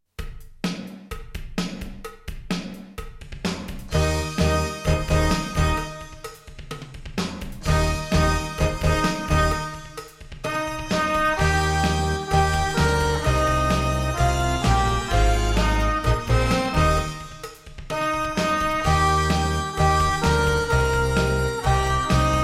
Gospel Rock

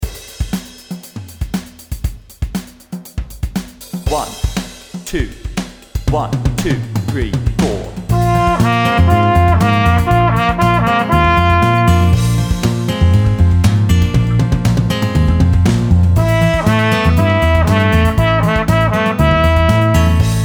Speed Slurs Bb 2 and 4, 120

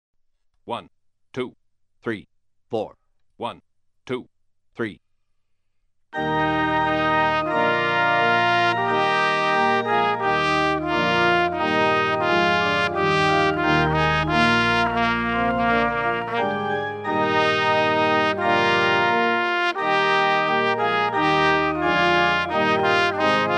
Trumpet Voluntary

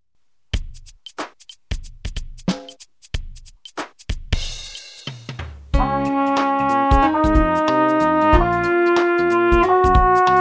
Five Note Scales Eb

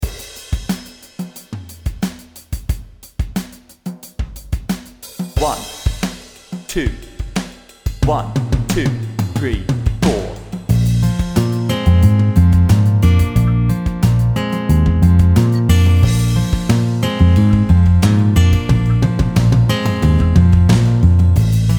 Speed Slurs Bb, 90

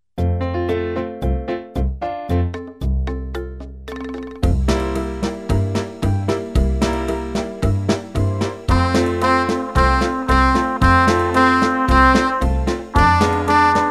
Rumba

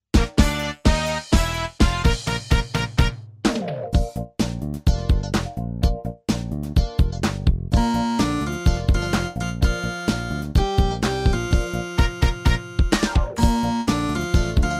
Kum B JAZZ

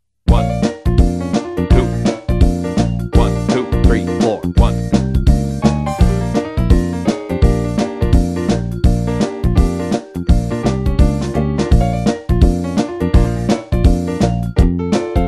Jazz version; The Saints

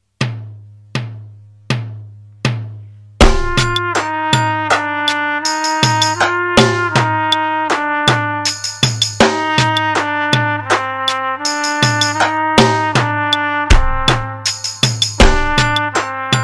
easy slurs medium metro track

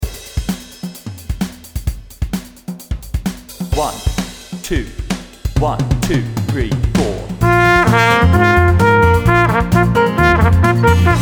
Speed Slurs Bb Example @130

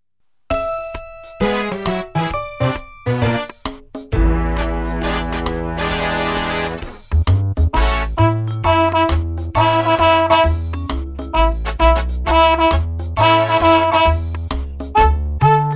The 2nd Valve Blues with break

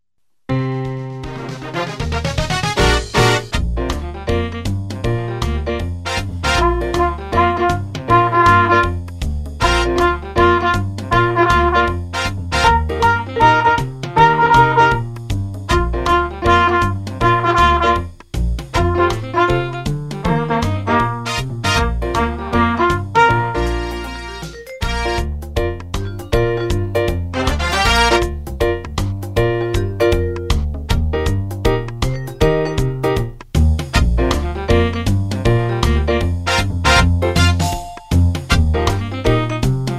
Blues

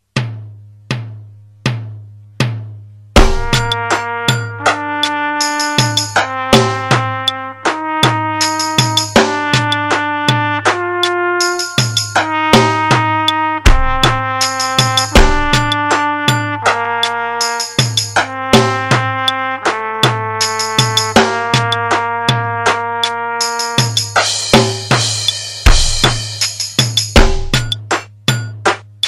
medium metro; aerobics book

